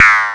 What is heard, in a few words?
BOSS PERC SNTH, DRUMS PERC; Rubber Chicken Software Sample Bank